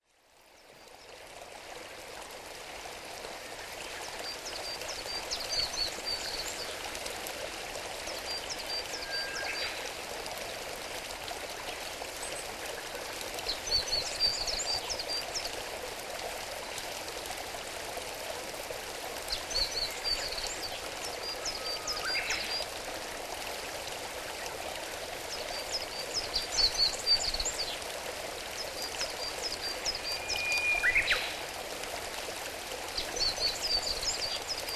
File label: murmuring